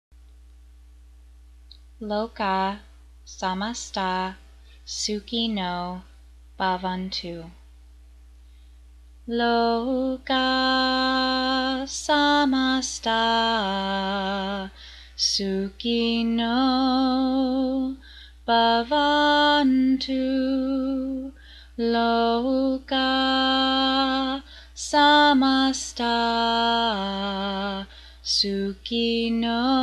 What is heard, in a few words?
chant